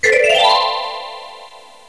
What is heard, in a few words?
happy sound; Bell tone xylophone ramp up.